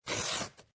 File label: Rip Cardboard, Rip cardboard, tear cardboard, rip paper or tear paper.; Ripping; Lynne Music